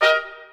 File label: Squeezebox Confir; Levels Hub Bayou; Music Riverboat; Squeezebox Confirm